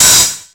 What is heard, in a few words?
909 DIST OHH; Wizoo - Platinum 24 Electronic